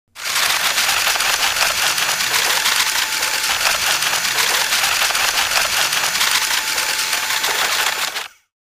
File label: Blender Run Chopping